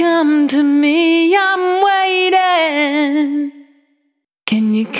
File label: Come to Me, Vocal Samples, Single Voice Melodic Loops; Singles